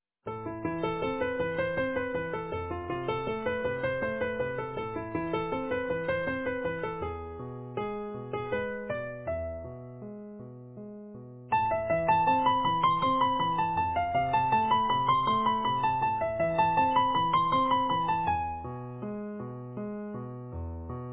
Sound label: easy piano